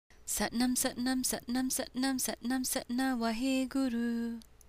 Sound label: Throat Mantra